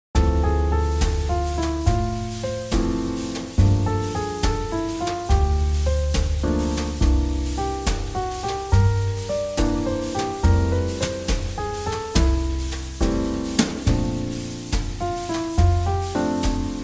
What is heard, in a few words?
JazzBallad; 잔잔